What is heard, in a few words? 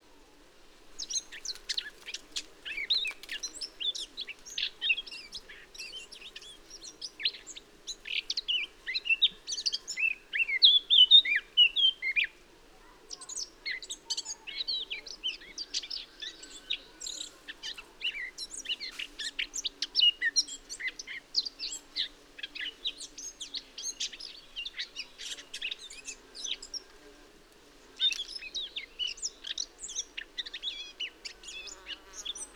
plastic